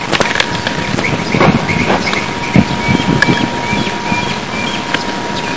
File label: birds